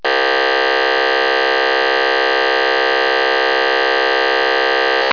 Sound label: EDACS Defeat; EDACS Scan-Defeat Tone; The actual name for this sound is 'Scrambled Data Calls'